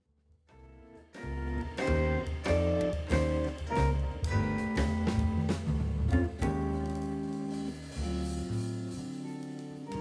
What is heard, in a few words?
Blues